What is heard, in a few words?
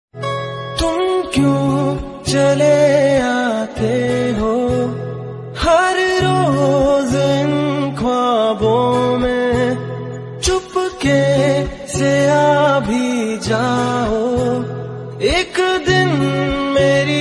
Old Hindi Song